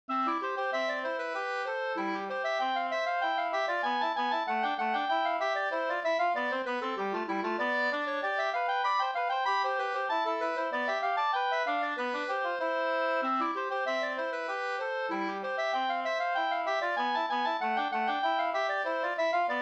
slangpolska

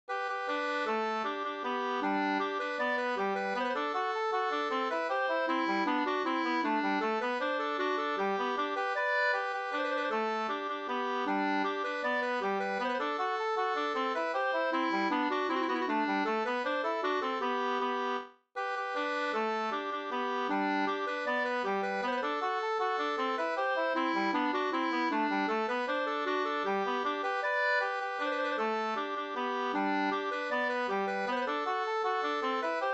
hambopolkett; Kringelstoten Sd 2st

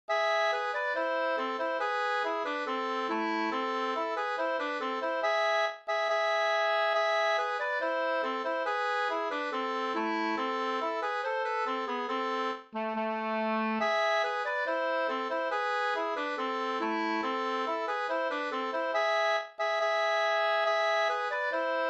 brudmarsch brudlat

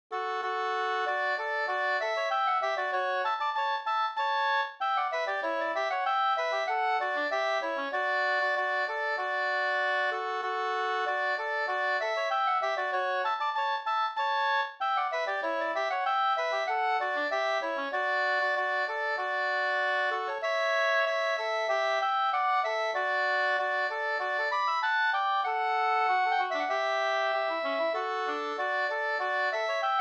ganglat marsch; e August Widmark Nr1 2st

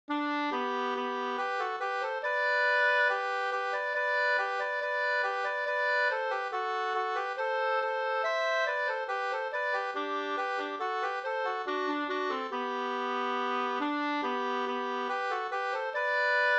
skanklat

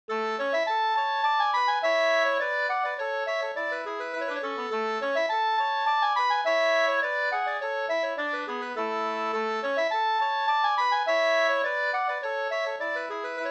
brannvinspolska, 2st

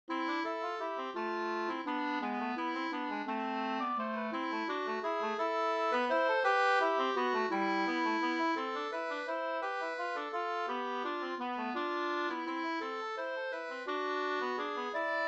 vals

